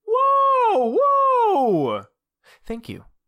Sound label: notification sounds